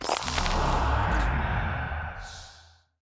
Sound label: Spell Tower Hold Cast